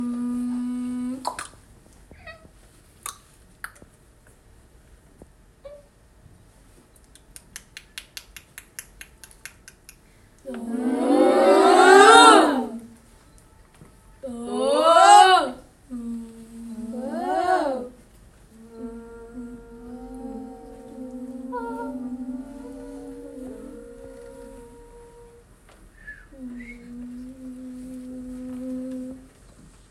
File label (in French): les bulles de la sonorité